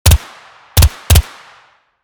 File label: Tactical submachine gun two three round bursts; guns